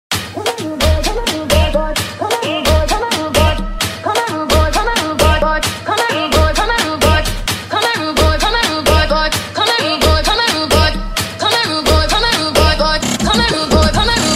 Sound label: JAZZ FUNK SOLO